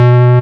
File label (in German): Short Layer, baesse